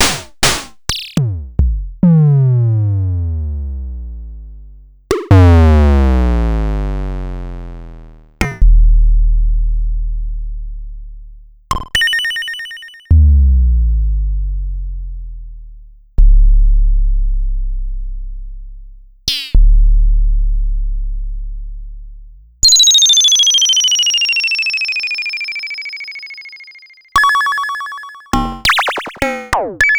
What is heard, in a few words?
Pollard Syndrum; m8